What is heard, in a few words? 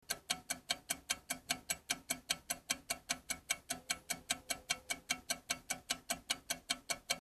ticking sound